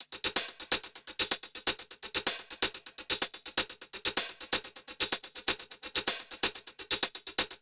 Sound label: 126 drum loop hats